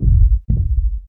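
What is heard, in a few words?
heartbeat, EKG sound; Total Control - Action Cutz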